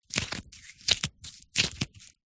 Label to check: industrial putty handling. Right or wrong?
Right